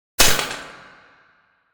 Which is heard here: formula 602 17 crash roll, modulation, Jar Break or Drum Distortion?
Jar Break